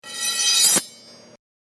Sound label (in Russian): zvuk ostrogo nozha